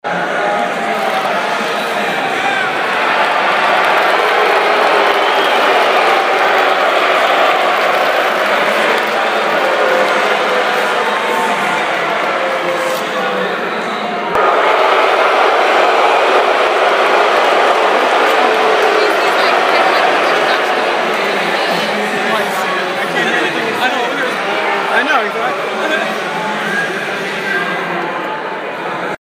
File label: Boos